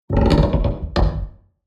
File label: Old wooden door creaking; household